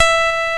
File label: clavi; Inside Mac Games; X-Mas Lemmings Demo; Music